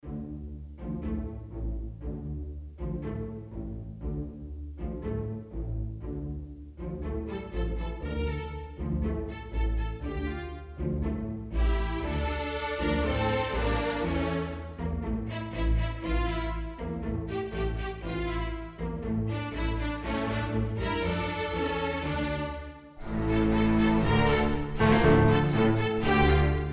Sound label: Tango Pequeno